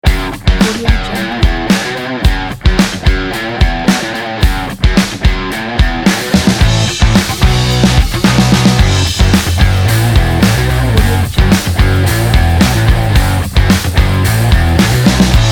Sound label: energetic background rock